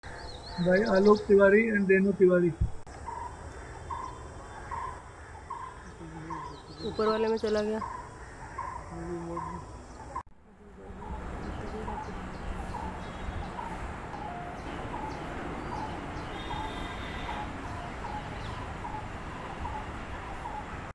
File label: Brown-headed Barbet